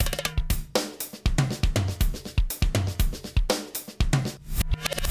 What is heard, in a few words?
bg Music; Battle Day Brawl; ThrillGames